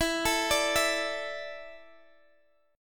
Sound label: E 7th Suspended 4th, Guitar, Drop D